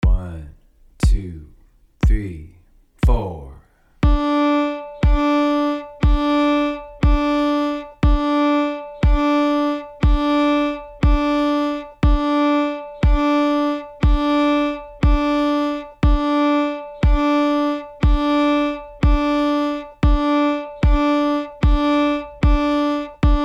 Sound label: quarter notes D, violin